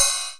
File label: Ride, Cymbals; Vengeance Samples